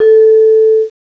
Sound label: vibes, mallets; CMI Sound Library Rev 1.3